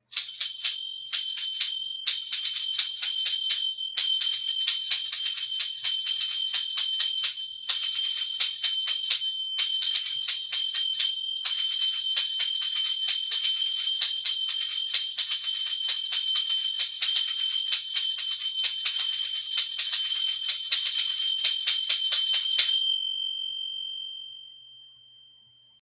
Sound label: kartals tingsha